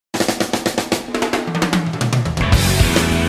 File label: Fill